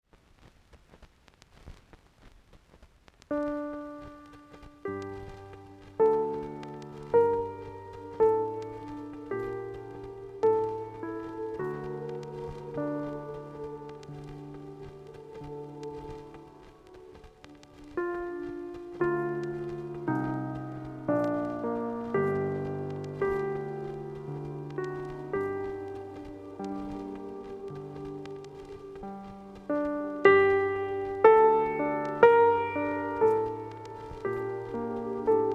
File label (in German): Piano auf Vinyl